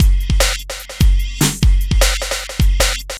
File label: Electronic, Drums & Percussion